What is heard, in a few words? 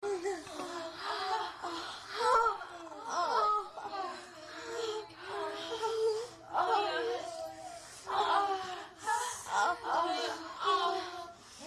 many sex, moans; The Witcher Hunt